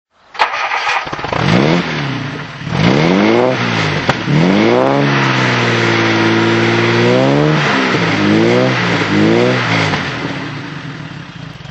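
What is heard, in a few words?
jaguar xk140 dhc auto throttle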